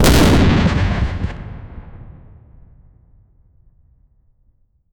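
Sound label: heavy explosion of artillery, detonation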